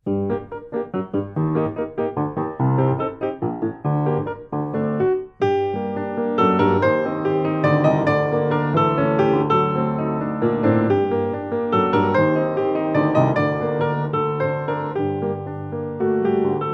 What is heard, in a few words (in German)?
Klavierstück in g moll Serenata